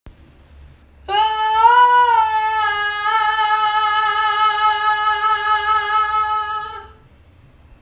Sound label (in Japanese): 高音スケール